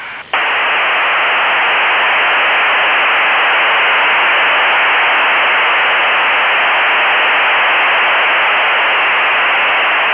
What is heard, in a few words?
mil std 188-110c appd bw 3 khz waveform number 7 psk-8